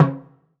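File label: TIMBALE, Drum Machines; EdgeSounds; Drum Mashines VOL-1; X-DRUMS KIT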